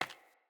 Sandals Run, Footsteps; Player

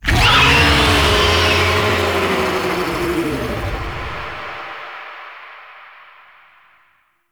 Arrive Stinger; Dactolyst; Warframe; Tau; BoroOverlook

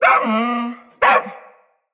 Wolf Cub Trapped Call Far; Animals; Wolf Cubs; Orengal Wolf Cub C

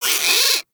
Forest Rodent Vocal Angry Short; Warframe; Characters

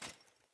Movement Armour; Characters; Enemy; Foley; Sinecure; Melee Grunt

